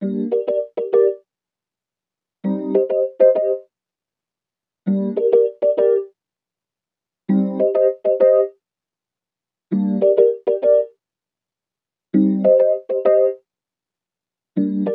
099 E-Coast HipHop Gmin, E-Piano; Pro Tools Essential Loops; Digidesign

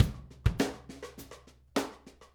102 Rim Grooves, Drums; Pop Rock; Moderate Tempo; Pro Tools Essential Loops; Digidesign

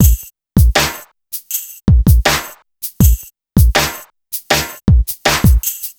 Hip Hop Beat, Drums

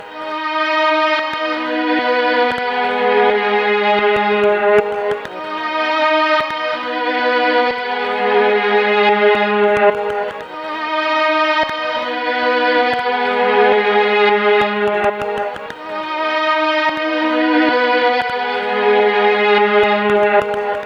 Pop Tape Echo Strings Abm; Pop Rock; Pro Tools Essential Demos; Digidesign; Essential Loop Demos; Demo Session - Pop Rock

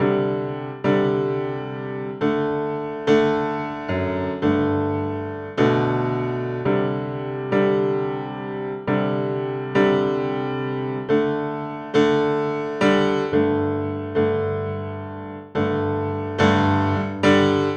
Country Piano Chorus 2; Digidesign; Pro Tools; Pro Tools Essential Demos; Essential Loop Demos; Demo Session - Country

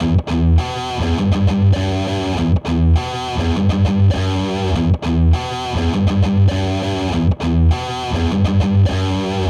101 Indierock Guitar Dmin; Pro Tools Essential Demos; Essential Loop Demos; Demo Session - Rock Hard; Digidesign

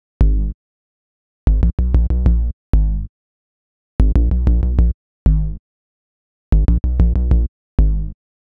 HipHop Synthbass D#Min; Digidesign; Pro Tools Essential Loops

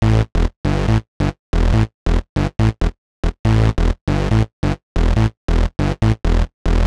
140 House Bass Amin; Dance DJ; Digidesign; Pro Tools; Pro Tools Essential Loops

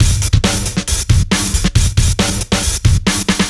137 Garage Drum Loop; Dance DJ; Drums; Garage Breaks; Pro Tools Essential Loops; Digidesign

